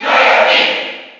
Cheer; Kirby; SSB4

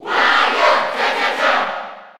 Cheer Spanish PAL; Wario; SSB4

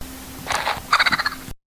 Samus Wiimote Sound

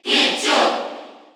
Cheer Korean; Pichu; SSBU

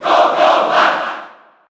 Cheer JP; Little Mac; SSB4

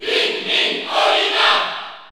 Cheer French; Olimar; SSBU

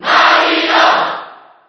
Mario Cheer JP; SSB4

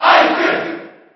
Cheer JP; Ike; SSB4

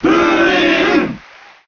Cheer JP; Purin; Melee

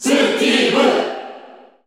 Cheer Korean; Steve; SSBU

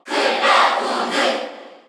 Cheer Korean; Cloud; SSBU

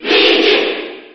Cheer JP; Luigi; SSB4

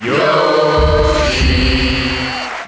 Cheer International; Yoshi; SSBM

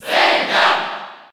Cheer French; Zelda; SSB4

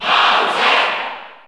Cheer Italian; Bowser; SSBU

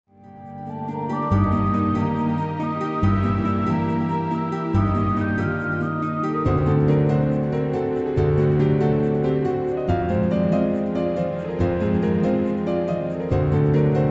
Beta Temple music

